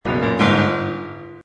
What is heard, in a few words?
fencing end; Casanova